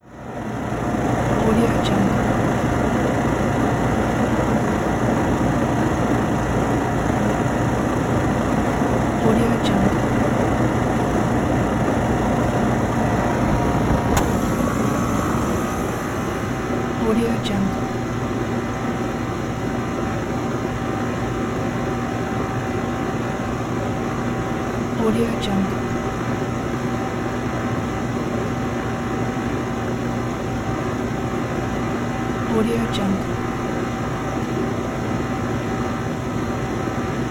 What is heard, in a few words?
air conditioner fan